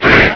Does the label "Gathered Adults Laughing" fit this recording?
No